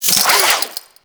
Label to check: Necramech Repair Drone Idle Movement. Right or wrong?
Right